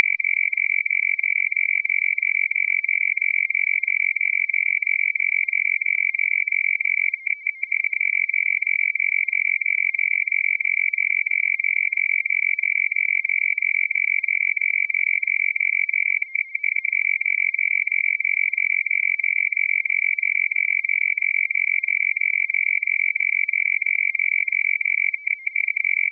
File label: fsk bpf, rtty